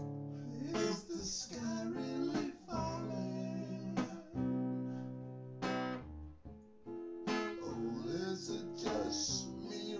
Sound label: nodrums synthconv 250ms 5cm mix; SiSEC10; underdetermined